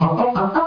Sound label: UH OH SYNT; HOLO